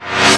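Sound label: backwards trash can, Sound Effects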